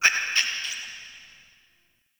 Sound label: Interior Screechy Call; Ambience; Indoor; Warframe; Infested Eris